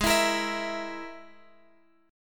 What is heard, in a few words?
Ab Suspended 2nd Sharp 5th Ab down Guitar Open G